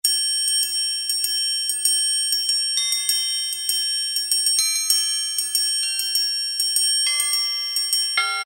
TRIANGLE, Roland SN-U110-02 Latin And F.X. Percussions